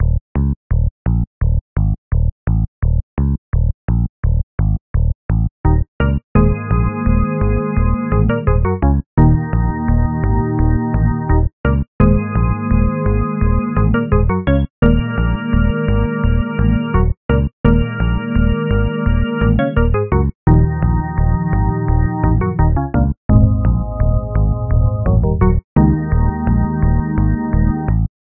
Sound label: train station; white lie ost